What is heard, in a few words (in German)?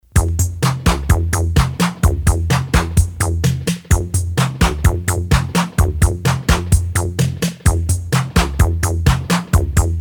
Herzschlag Tanzversion